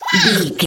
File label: goblin fairy attack low